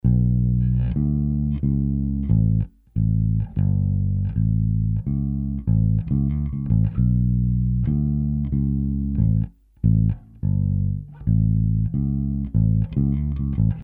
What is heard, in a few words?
old bass; Ликвидация коробочки и примерка нового места для батарейки